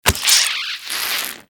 wet gooey splat with suction

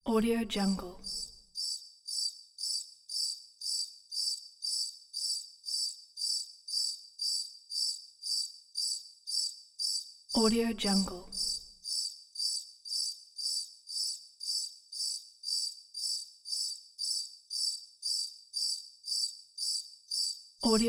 scary atmosphere

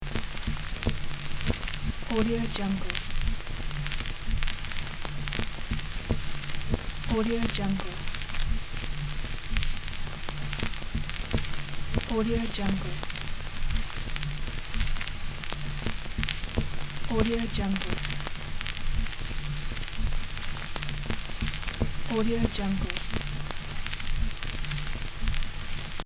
vinyl

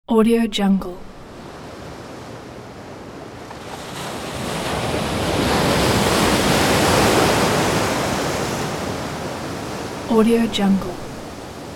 ocean ambience with medium waves coming in; sound ideas

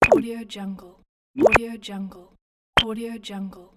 buttons

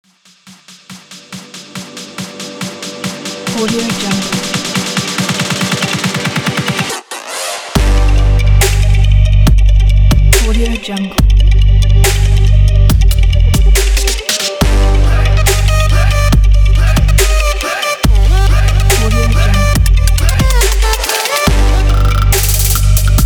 the club trap

